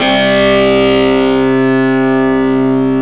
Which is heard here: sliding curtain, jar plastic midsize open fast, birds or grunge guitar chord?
grunge guitar chord